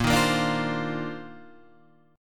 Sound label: C Minor 11th A sharp